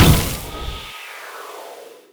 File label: ice bomb target; weapons; dota; creep; neutral